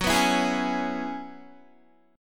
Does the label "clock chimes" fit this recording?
No